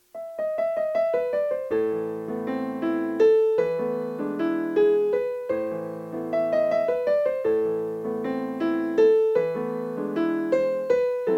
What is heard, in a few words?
For Elise arrangement; L.van Beethoven; arrangement by Dias Karimov